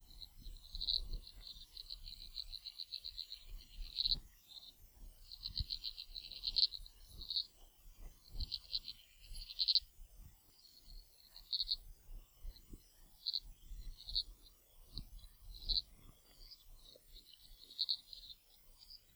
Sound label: Tadpole Acoustics